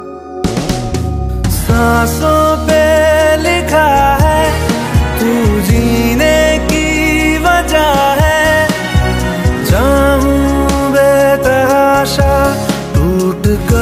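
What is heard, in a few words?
bengali ringtone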